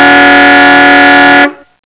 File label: auto horn; laundry truck; sound effects and sound reinforcement